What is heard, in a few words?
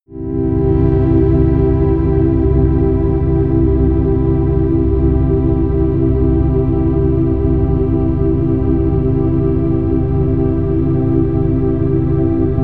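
hallow air, pads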